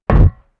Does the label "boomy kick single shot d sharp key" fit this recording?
No